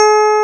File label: EPIANO; Ultrasound Patch; 32,000 sample rate; 0.05 seconds